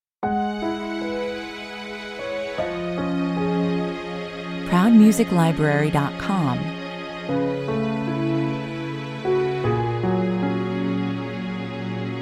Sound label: daytime drama tv 77 bpm